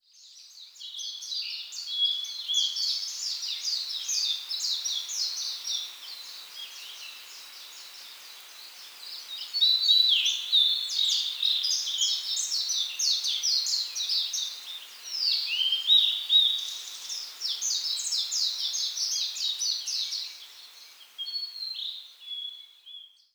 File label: bird